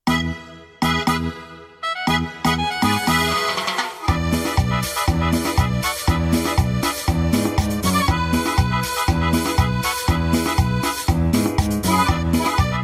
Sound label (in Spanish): Cumbia; ritmos